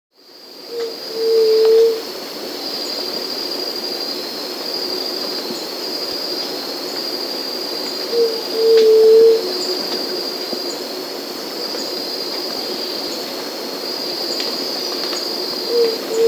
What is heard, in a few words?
White-tipped Dove (Leptotila verreauxi), Peru; Birds of the Amazon; S. America